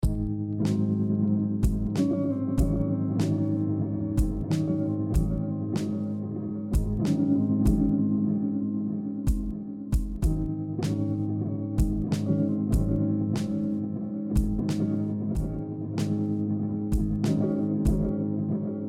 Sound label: new pulse